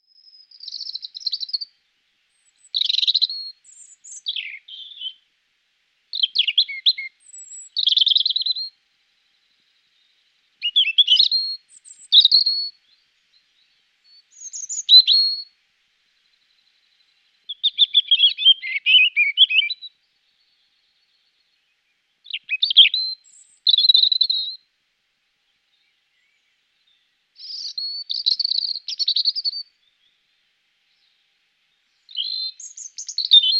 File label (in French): rouge gorge